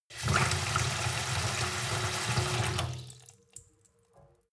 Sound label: sink fill with water medium; industrie-wirtschaft; gastronomie